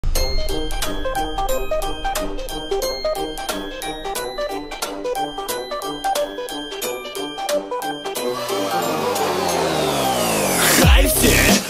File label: rap на русском; fnaf 2